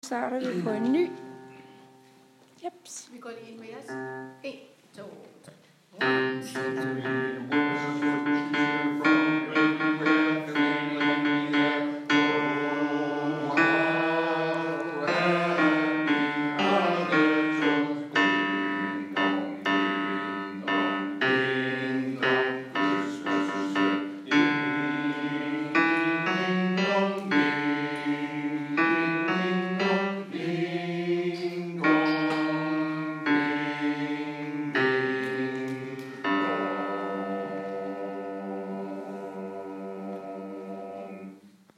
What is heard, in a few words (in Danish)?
carol of the bells bas